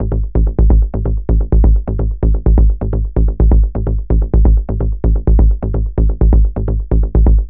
Bass Loop A 128, Melodic Techno; Steep; Ghosthack; AC2024 - Day 12 - Melodic Techno

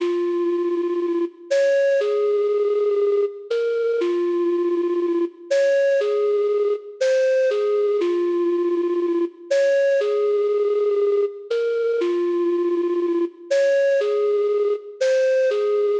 flute, 120 BPM F Min; Void

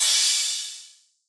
crash, cymbals, drum one shots; Crashes; Cymatics; Cobra Hip Hop Sample Pack

